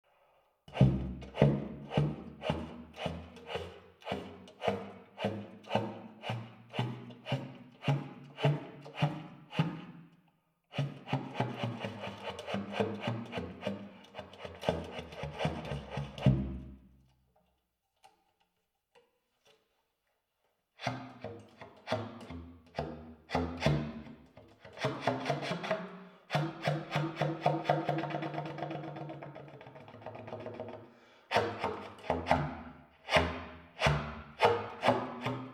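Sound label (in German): bar, Tongue Ram, Zuerst mit, dann ohne Mundstück. Bariton; saxophon; spieltechniken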